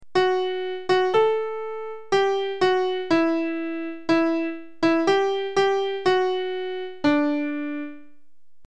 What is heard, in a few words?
calypso light